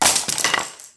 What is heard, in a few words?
Objects Fall On Ground